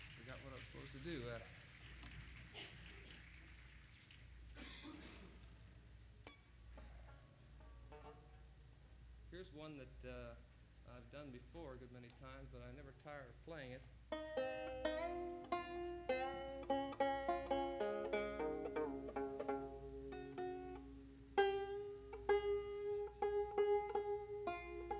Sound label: Applause